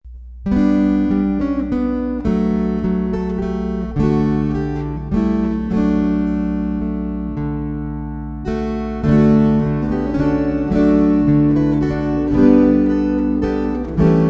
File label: Waltzes